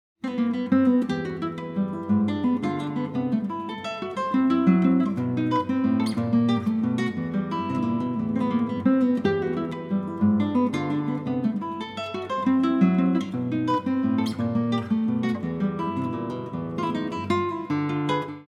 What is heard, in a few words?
Waltz